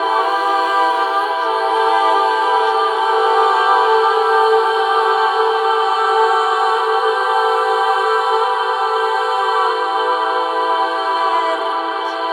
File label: vocals wet, with airspace, hybrid reverb and delay; modeaudio